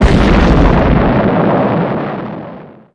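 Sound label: explosion long; Tanks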